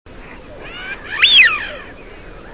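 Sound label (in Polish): świstun, głosy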